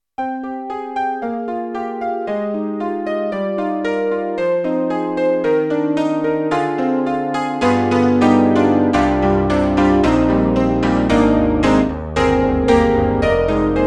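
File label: Rocker, piano; Roland; SRX-07; XV-5050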